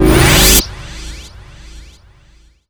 Charge Energy

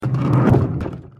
minecart

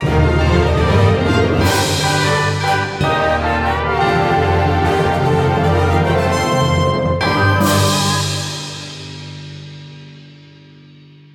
Gift Box Music; hot lava; ios macos windows

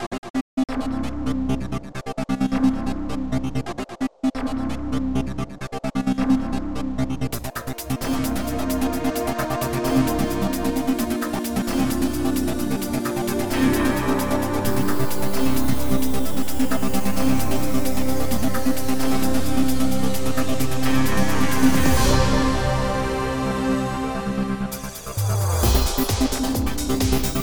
Euro Dance Mix; Unreal

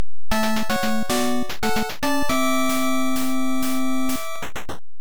2nd, 3rd Period Start; Hit the Ice; NES

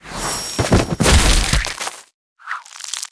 Dungeons Level Done; mighty party 2017 gamerip pc ios android

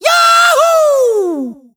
Yahoo; Nicktoons Nitro; arcade

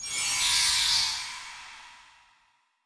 Btl Hitlrng Light; The Denpa Men; Android

